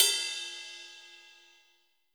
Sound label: big ride G key, Royality free ride cymbal drum sound tuned to the G note.